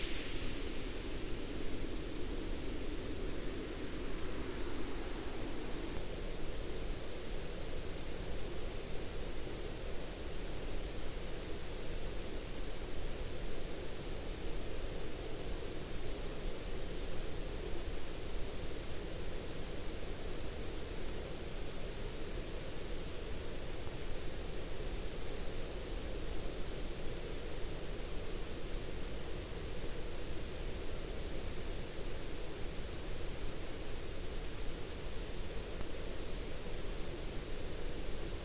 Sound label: WFR audio emfisis